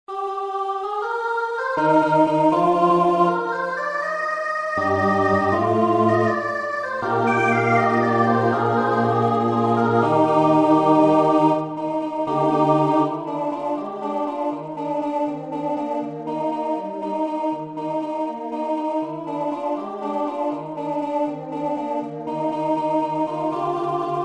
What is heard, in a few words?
Alto